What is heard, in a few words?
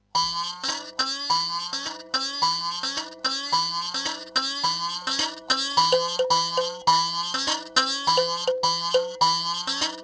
mbira